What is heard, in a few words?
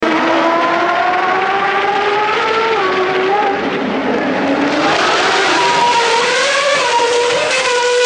racing cars